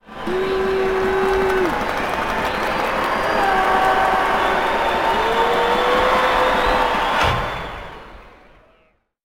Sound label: Basketball Pro Crowd Yelling Ball Hits Board